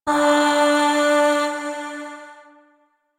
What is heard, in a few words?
Void Shawzin Oct Two D; Warframe; Zariman